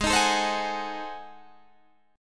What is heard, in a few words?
Ab 7th Flat 9th Guitar Open D, chord